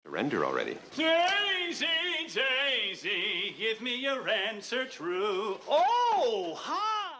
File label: daisy bell; war co-respondent